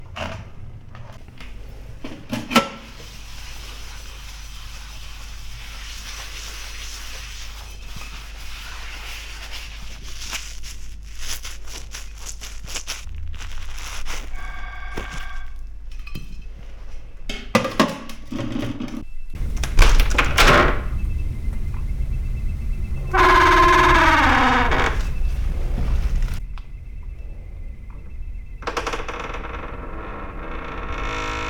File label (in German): In Der Kueche; Fieldrecordings; Cafe in Lissabon